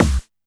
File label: Kick, Alesis DM5